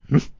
piglette oink; Angry Birds Space

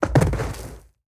Death Body; portal quest; android; Dumbledore

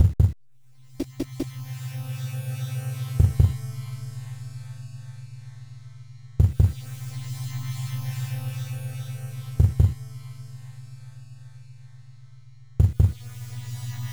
Silence; Battle Golfer Yui; genesis

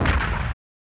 Explosion; Ginga Sengoku Gunyuuden Rai; SNES

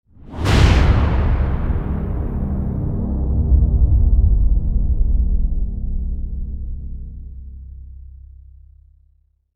Tense Stinger

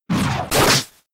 Air Slash; Pokemon; Gen 5; Blk Wht Blk2 Wht2

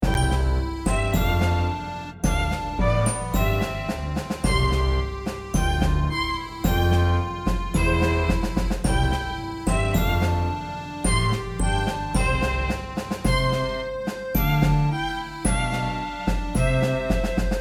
(Orchestra); dragon fantasy book i the volumes of westeria

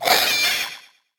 windup; Thorn

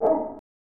"Woof!"; Shadowrun; snes

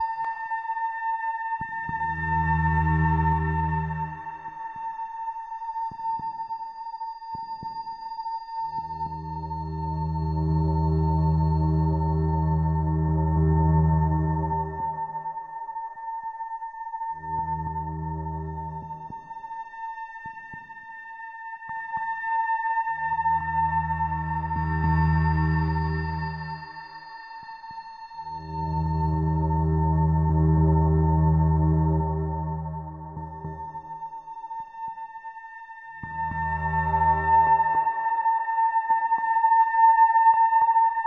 Mood Creepy; Phoenotopia Awakening

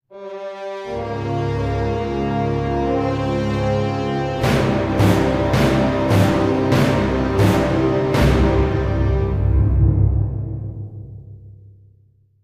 Lose Battle - Dungeon; heroes of might magic v gamerip 2006

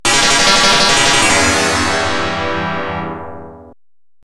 Unused Jingle; U.S. Championship V'Ball; X68000; U.S. Championship Beach Volley

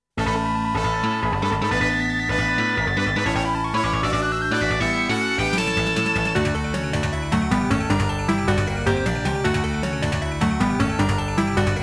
Click! Click! (Scenario Clear); Sorcerian Super Arrange Version

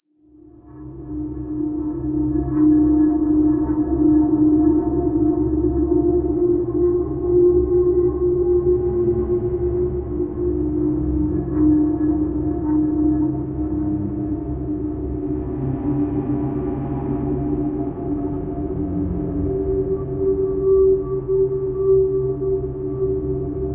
playground amb; Identity V

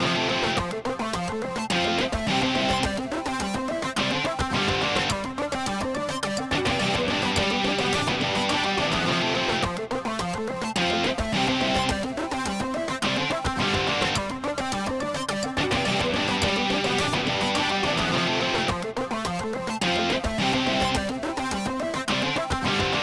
Melody Loop; bakugan champions of vestroia 2020; switch gamerip

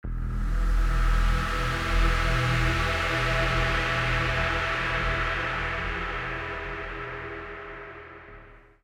Jingle Mission Failed; Daemon X Machina Prologue

